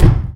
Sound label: bathtub land; Golden Crayon Sounds